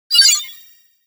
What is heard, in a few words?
fast sci fi bleep